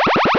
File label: LASER; 激光